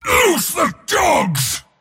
cast pain; dota; mars

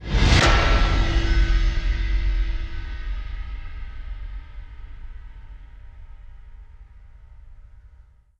cards deal; ui

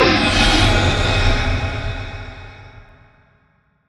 spell steal cast; weapons; dota; rubick

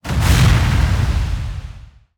fire shield damage; weapons; dota; ogre magi

